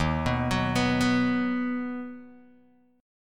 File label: D sharp Minor Sharp 5th D sharp down Guitar DADGAD